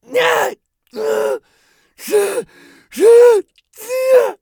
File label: agony; Escape from Tarkov; scav2